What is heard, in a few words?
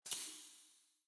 museum door